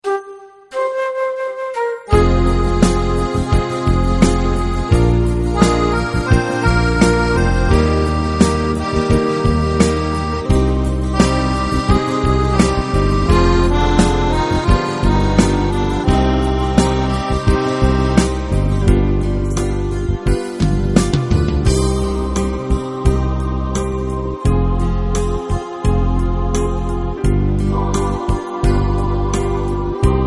instr

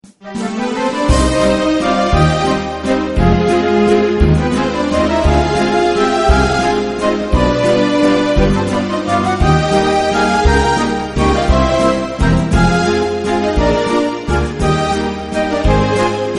maryjo badz instr

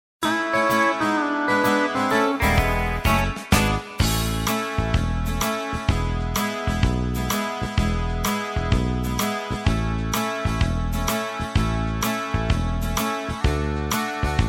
chrzescijani instr

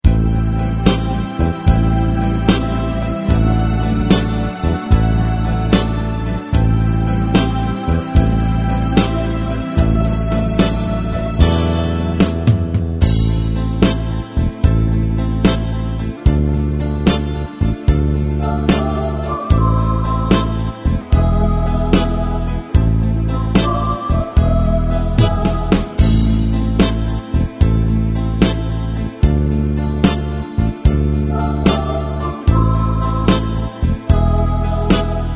stoje przed instr

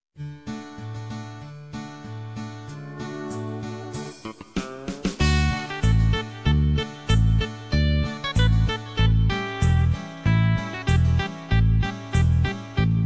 krakowiak kosynierow

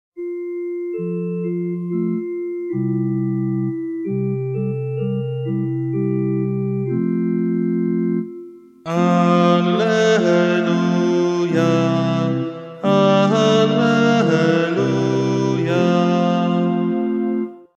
maryjne alleluja

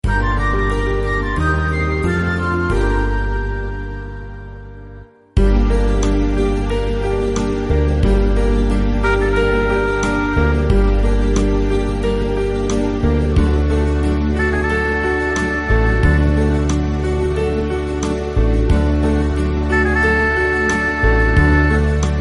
mario wzywam instr

